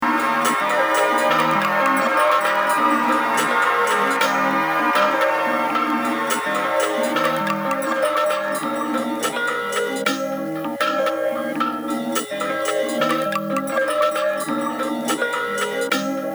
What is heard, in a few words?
Chime End